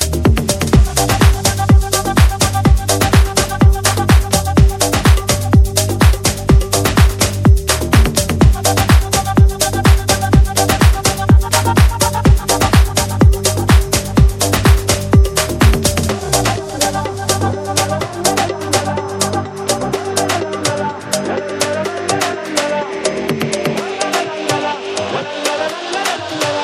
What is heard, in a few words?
Afro House